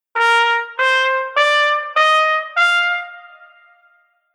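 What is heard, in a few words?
Horn Marcato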